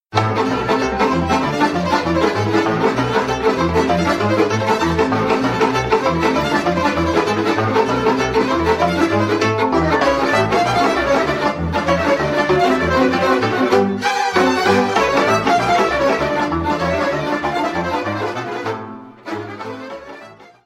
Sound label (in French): Sirba moldoveneasca - Roumanie